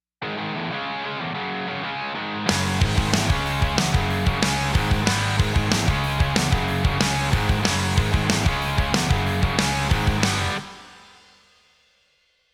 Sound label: tape eq flat